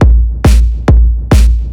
drm 138, Techno, drum-loops; Best Service ProSamples vol.45 - Techno ID

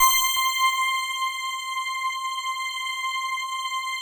digi pad, Pads

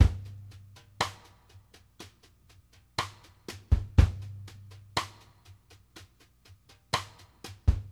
STRGHT AHEA, ballad 60; Sampleheads; New York City Drumworks VOL-1; SP BALLAD 60

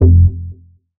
Bass F, Bass Synth

